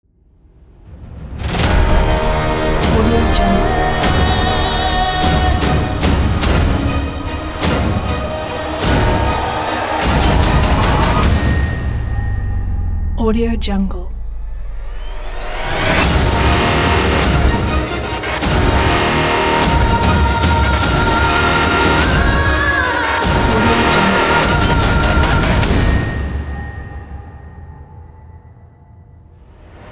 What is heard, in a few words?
apocalyptic intense hybrid trailer